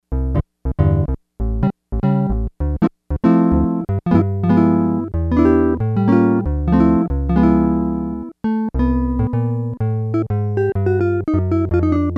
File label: Jazz Guit, Yamaha DX100; Factory Patches